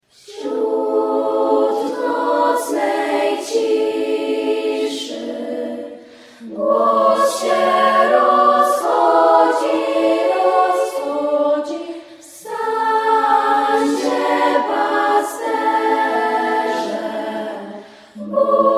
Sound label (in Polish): dzwonek